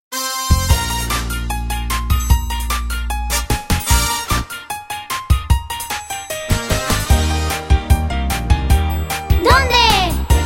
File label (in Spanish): Karaoke